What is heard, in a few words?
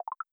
open